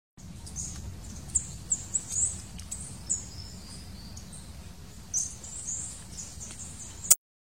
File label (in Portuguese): Canto da saira parda